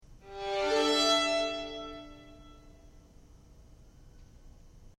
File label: Vln Open Arpegg